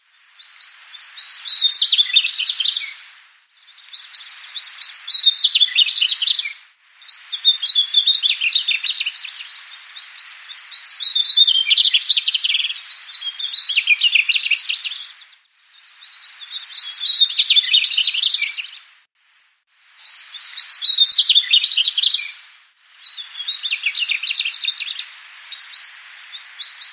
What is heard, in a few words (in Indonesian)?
suara sikatan mugimaki song